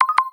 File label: Charge